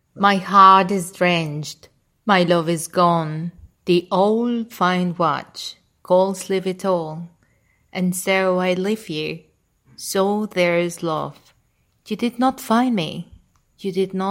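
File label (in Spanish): el reloj viejo en ingles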